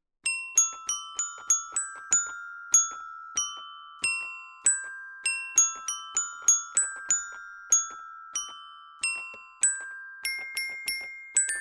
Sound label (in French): maclotte pour baby piano